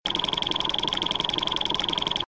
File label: Roll Start